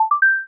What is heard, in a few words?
charge complete